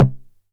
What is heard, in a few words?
909 SD Tape